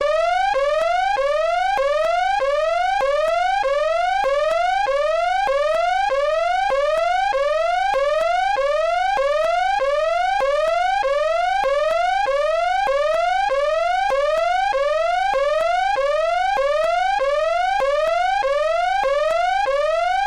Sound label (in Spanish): Alerta intruso